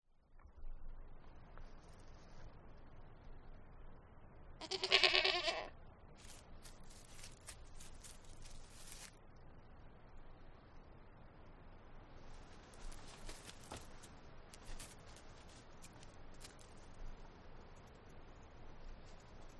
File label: Goat Bleating; na wsi; odglosy zwierzat; kwiecien